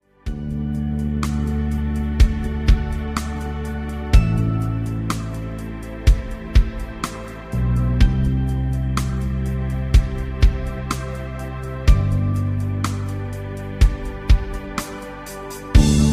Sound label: rhythm